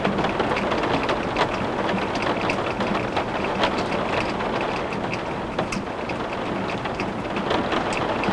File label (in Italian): Pioggia su grondaie